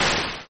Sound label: Forcefield cut chiptone